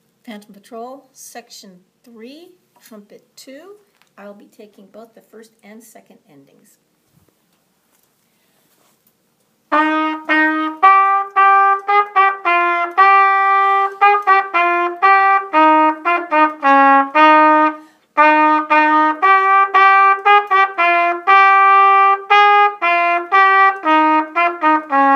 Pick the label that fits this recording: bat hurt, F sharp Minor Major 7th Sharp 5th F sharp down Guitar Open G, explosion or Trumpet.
Trumpet